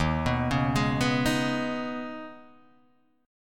Eb 7th Suspended 4th Sharp 5th, Guitar, Half Step Down